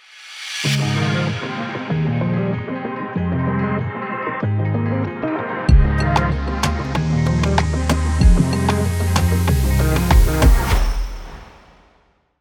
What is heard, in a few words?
Sci Fi Temple Bumper